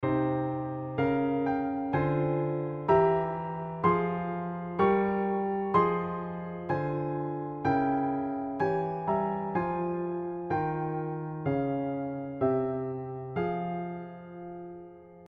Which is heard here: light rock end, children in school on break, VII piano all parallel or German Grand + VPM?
VII piano all parallel